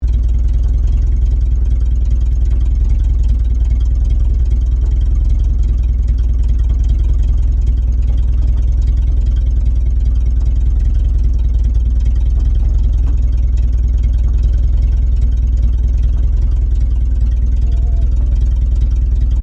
APC FV603 Saracen Idle Exhaust, British Saracen Light armoured vehicle; Trucks; Vehicles